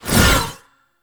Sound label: Whip Swipe; melee; weapons; Warframe; Dagath Blade And Whip